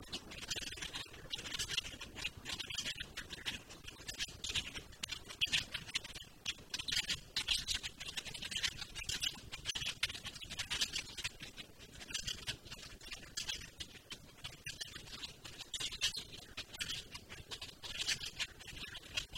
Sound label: Great-tailed Grackle, Quiscalus mexicanus; blackbirds orioles